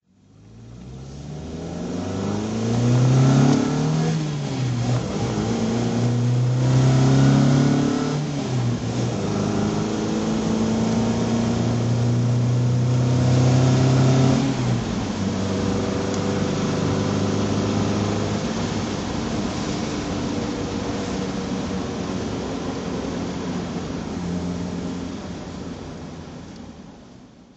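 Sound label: volvo pv544 rally drive, engine sound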